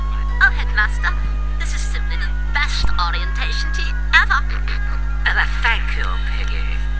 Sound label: piggy